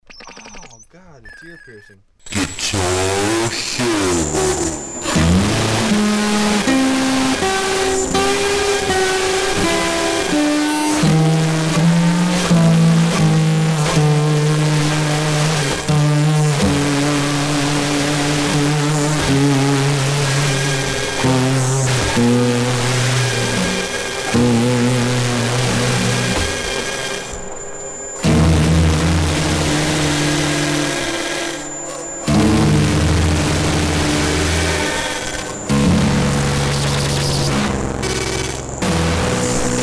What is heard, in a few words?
Piercing Guitar Solo